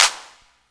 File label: CLAP, Dance Drums